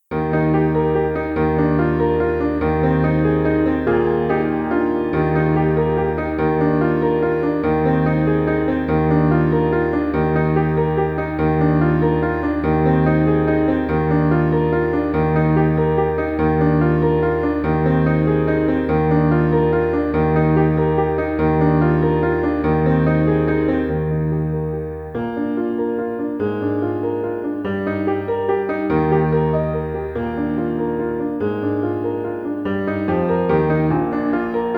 rondo accompaniment; elementary solos accompaniments

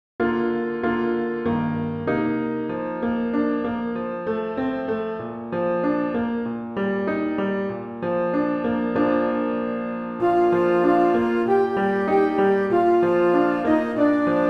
solo

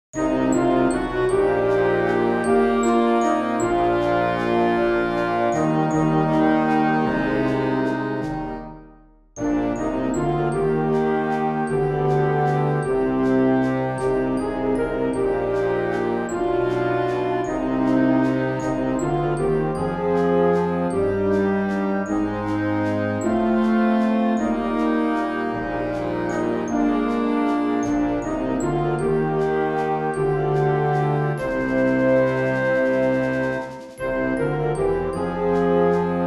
flex band

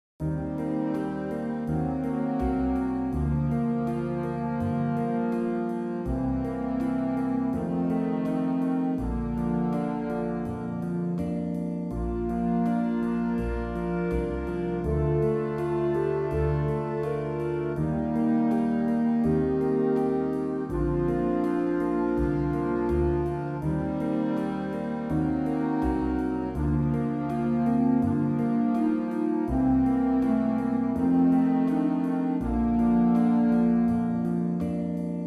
jazz combo

